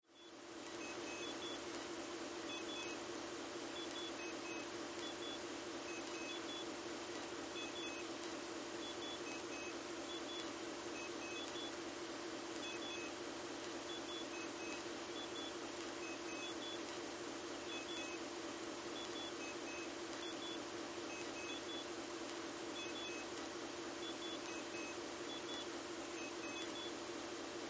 Emergency Surveillance Truck Interior; Emergency Vehicles

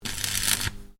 Welding Arc Short, Arc welder; Welders; Machines; SoundEffects-Foundation4

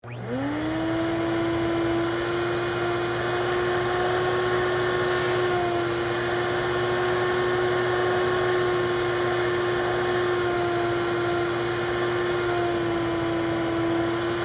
Shop Vac Sequence, Clean, Up, Long; Factories

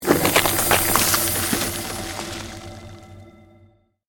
CREATED MAGIC SPELL FREEZING TOUCH

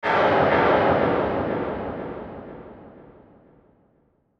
Descend Reverb Delay; Descending Sound Effects; Blastwave

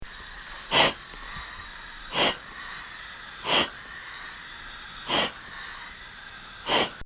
Ambubag Respiratory

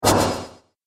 Industrial Noise Impact; Impact Sound Effects; combat

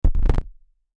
Record Needle Lift, Record Needle Lift Up; DJ; Musical; Blastwave

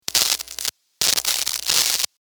sparks, Electric Spark; Sustained Hum And Spark , From Guitar Cable

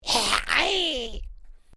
Created Creature Small Call, Alien Sound Effects

